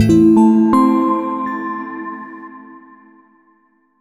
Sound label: Spell Discovered; Legend of Grimrock 2012